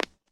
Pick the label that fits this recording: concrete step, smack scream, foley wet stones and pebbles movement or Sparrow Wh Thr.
concrete step